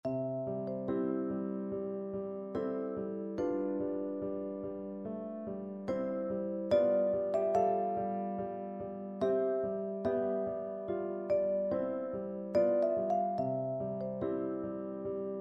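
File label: vibraphone, percussion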